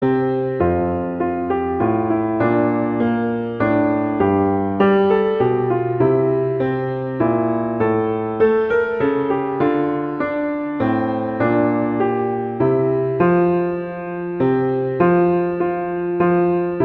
We Wish You a Merry Christmas, Christmas Beginners